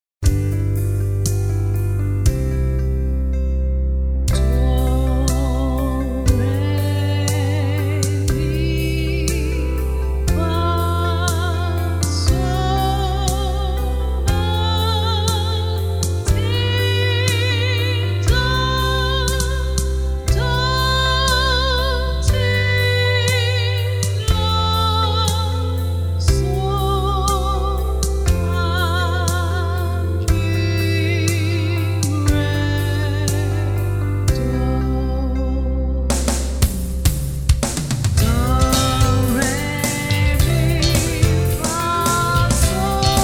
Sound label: Music Alphabet Song Chant